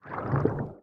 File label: squid idle, minecraft